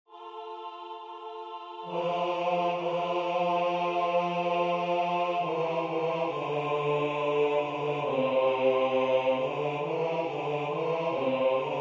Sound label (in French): basse; Constitues eos; Ravanello